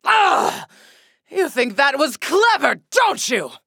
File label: Taking Damage; Paladins; Imani